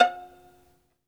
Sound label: SOLO VI, Synclavier Strings; ILIO